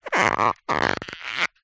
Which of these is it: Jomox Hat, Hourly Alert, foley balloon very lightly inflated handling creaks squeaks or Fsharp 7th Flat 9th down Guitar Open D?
foley balloon very lightly inflated handling creaks squeaks